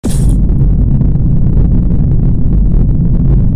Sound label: boost; motox3m-spooky